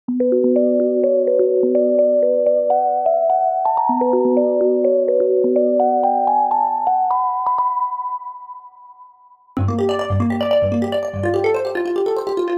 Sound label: Leads; Unison; MIDI Wizard